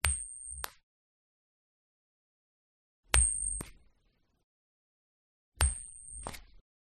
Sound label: Coin Toss Ringout Catch; PMSFX